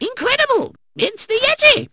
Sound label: BALLOON; Revista CD Expert 36; ProPinball